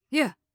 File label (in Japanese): 攻撃